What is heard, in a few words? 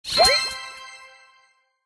Level Popup; The Adventure Pals